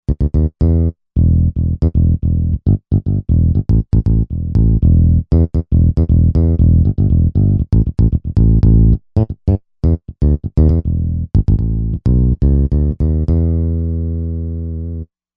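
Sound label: Bass Thump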